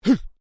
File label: MALE VOCALIZATION HUH; Exclamations; Voice